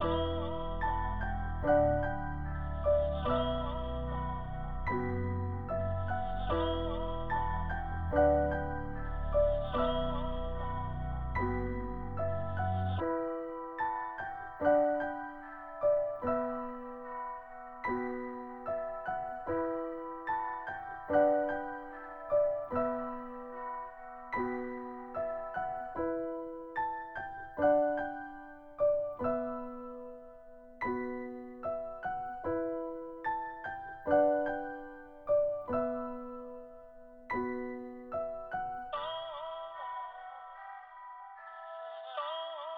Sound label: Crystal Ball 148 bpm Em